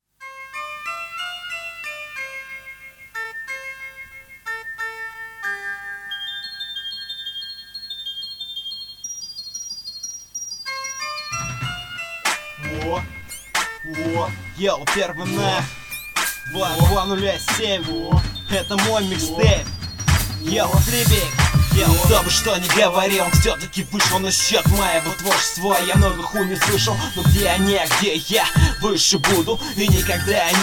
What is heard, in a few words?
instrumental